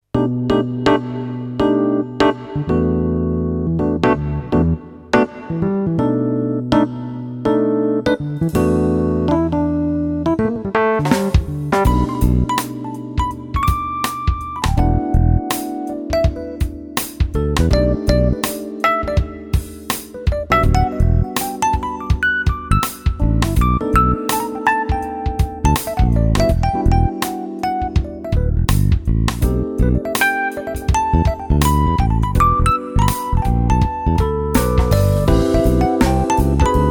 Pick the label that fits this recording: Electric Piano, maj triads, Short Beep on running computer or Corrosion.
Electric Piano